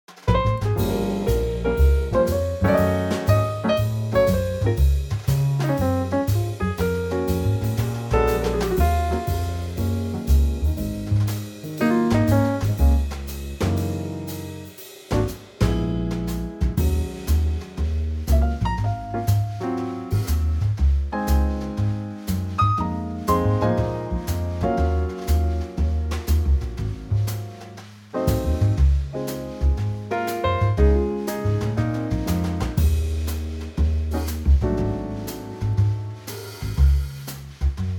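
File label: Db Trio, Backing Tracks for Male Vocals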